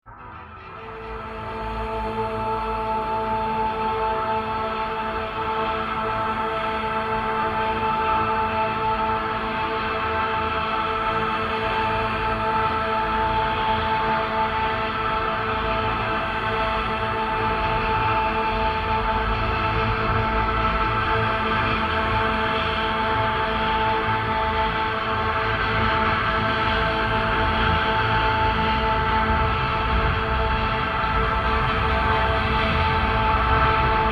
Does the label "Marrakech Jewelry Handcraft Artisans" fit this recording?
No